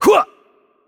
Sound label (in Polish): Attack Heavy; pl PL; bloom minigame